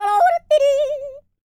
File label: YODEL; ILIO; Vocal Planet